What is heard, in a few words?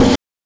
OH; NES DPCM; MIDINES